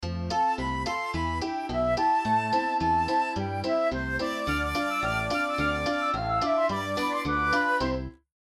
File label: Childrens music end; Slightly quirky and joyful, for comedies; Mood: Joyful; BPM: 108